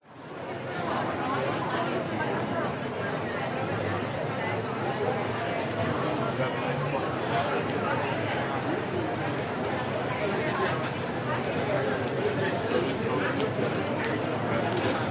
USA LAX Airport United Terminal 7 Baggage Claim People Walla